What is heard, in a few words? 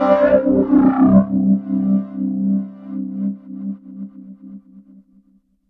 SE OVERD., Overdrivin'; E-MU Producer Series Vol. 6 – Analogia Project; Strictly Synthe; SE-1